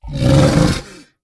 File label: Silverback Patriarch, Enter Play